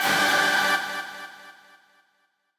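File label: b stab1 a, note G octave 5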